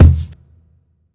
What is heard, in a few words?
Kick